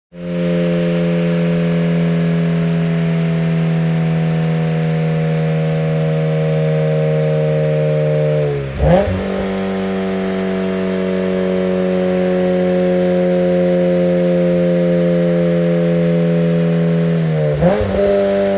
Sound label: TRANSPORTATION CAR Toyota GT86 Engine Brake Stop